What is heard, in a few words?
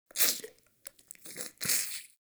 Wheeze Close; Ambience; Warframe; Infested Eris